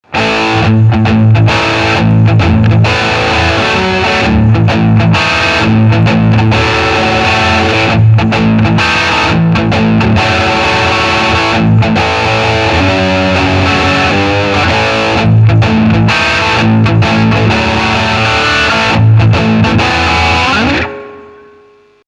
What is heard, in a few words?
heavy shuffle